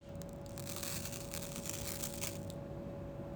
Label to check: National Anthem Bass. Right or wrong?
Wrong